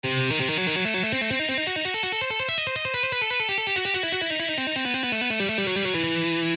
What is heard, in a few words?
Shredding Exercise